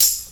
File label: Tambourine; Percs